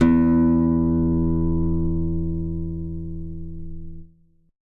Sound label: Guitar 6th string E